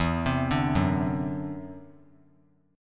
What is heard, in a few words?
E 7th Suspended 2nd Sharp 5th, E, down; E7sus2#5; arpeggiated